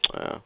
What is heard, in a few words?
"naa", tv click